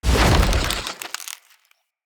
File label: Root Spider Mother Foley Jump